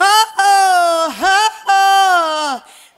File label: "oh oh huh oh" SUNG; ooh ahh